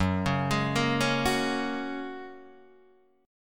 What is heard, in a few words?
F sharp Major Add 9th, Guitar Open D